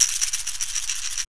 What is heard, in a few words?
Perc